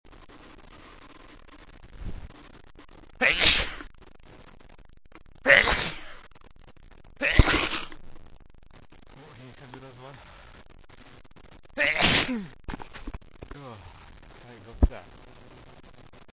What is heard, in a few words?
relief, male